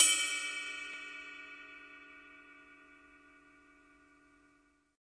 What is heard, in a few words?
CYM RIDE, DRUMS SINGLE HITS